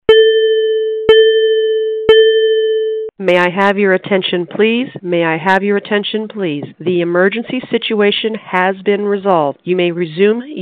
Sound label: all clear